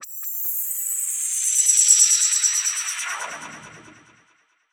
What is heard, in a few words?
Sfx, Trance; Zero-G Trance Inducer; General Sfx